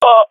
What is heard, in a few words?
death, swat fem; vo; Counter Strike Model Pack; CS:GO